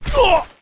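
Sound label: damage; Booker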